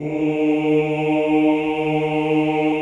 CHO ES2, Ooh

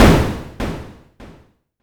snare, Korg ER-1

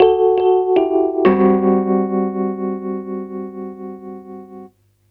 RHOD; USB Soundscan vol.32; Roots Disco; AKAI

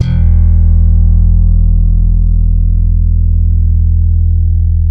Bass, E 2, ALL THUMBS; Spectrasonics; Bass Legends

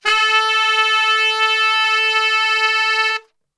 A 2X SAX STR, SAX STRGHT; Best Service; Brass Super Section

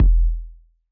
DJX 2B BD T8 Low Long, Yamaha

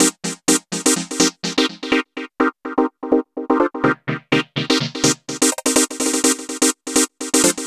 SYN 125, House synth loops

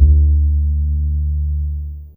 Viol Pizz D D2, Fairlight CMI IIe Pizz Str

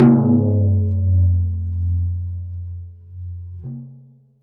Prepared Drums

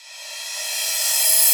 Reverse Cym, Cymbals; Vengeance; Essential Clubsounds Vol.4

